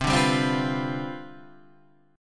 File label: C Major 7th Suspended 2nd Suspended 4th C